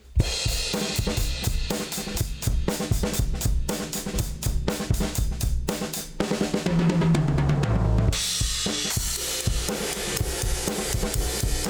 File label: Drums; ADC1 Compressor; Para Drums